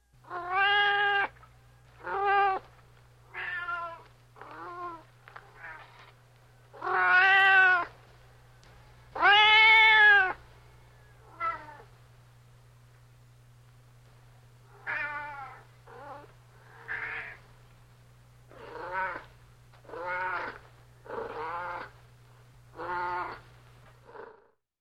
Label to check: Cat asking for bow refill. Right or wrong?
Right